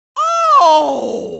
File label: OOOHHH